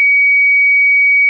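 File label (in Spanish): continuo, sirena electronica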